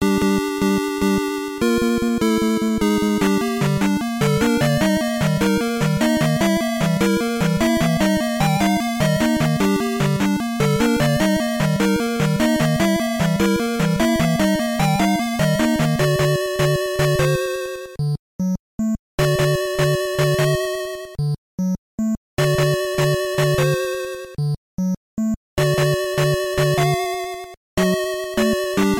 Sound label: Dungeon Theme; LOZ2